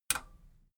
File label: metal button radio release enable